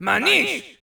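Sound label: men yells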